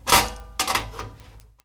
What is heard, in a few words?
wrench; mcheli